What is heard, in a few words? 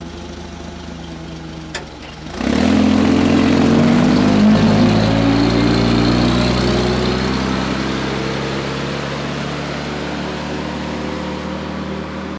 Forklift Out Medium-Borderline